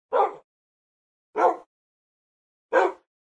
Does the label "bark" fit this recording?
Yes